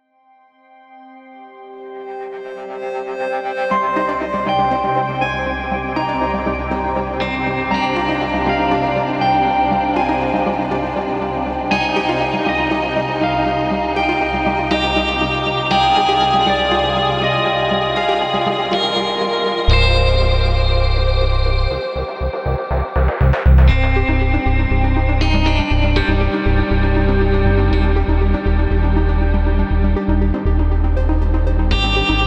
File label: gate